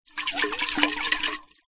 food drink metal thermos flask with small amount water in shake